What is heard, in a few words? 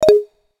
Movie Stop, Camera